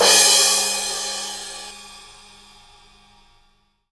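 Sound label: cymbal crash; Best Service Gigapack 2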